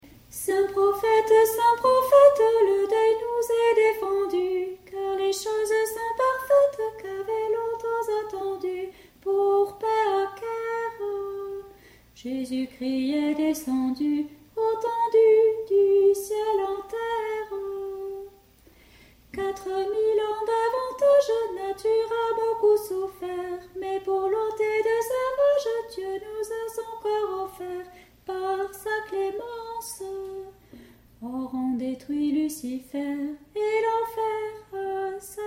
3e voix; sur la route des rois; saints prophetes

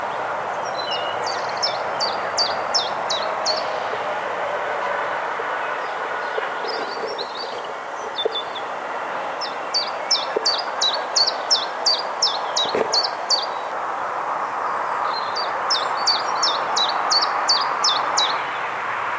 pouillot véloce friche; ville evrard

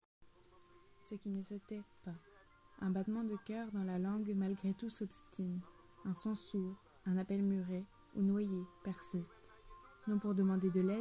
limite blues

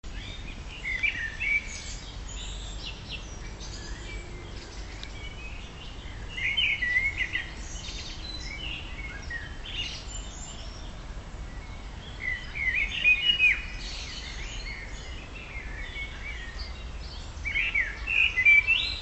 oiseaux

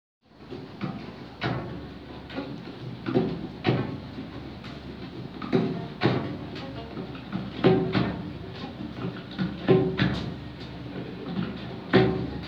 noeuds de tapis en soie

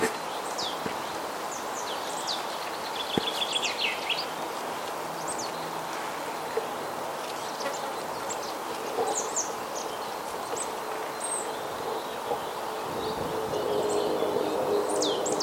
mesange nonnette mare

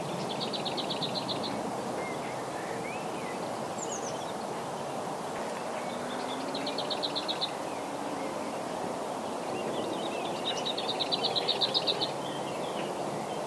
fauvette babillarde

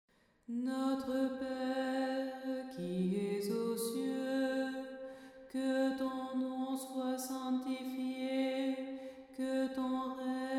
voix 3; notre pere rimsky korsakov

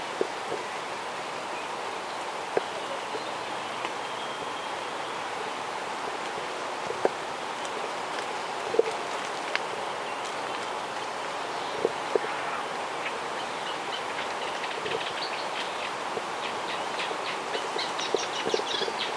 rousserolle effarvatte

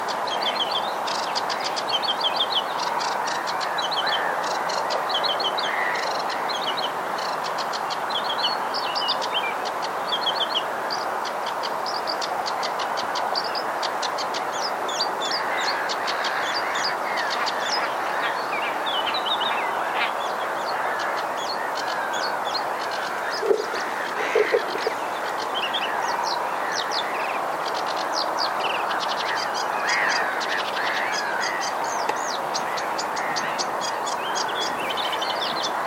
phragmite joncs étang

